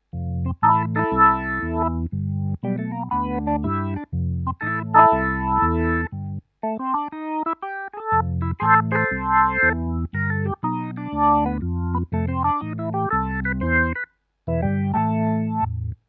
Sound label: Kurzweil Forte SE Classic B3